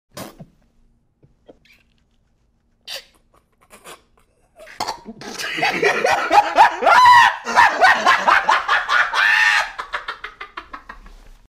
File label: guy laughing